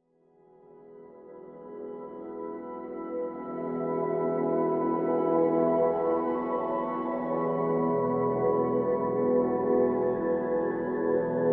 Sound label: Pads Celestial (E)